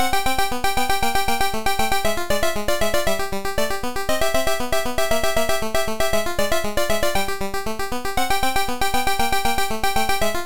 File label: BGM DIG1 BGM; Dig Dug: Digging Strike